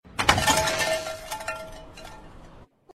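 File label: Door Bell Store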